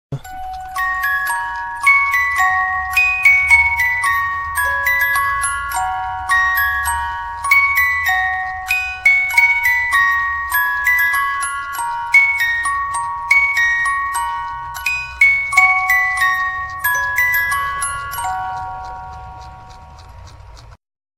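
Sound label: spieluhr, music box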